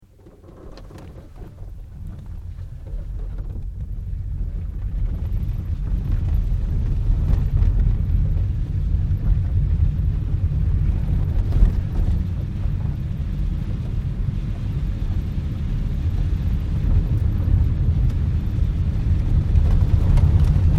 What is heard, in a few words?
Interior - downhill freewheeling over rough ground and through puddles Peugeot 205; Car; BBC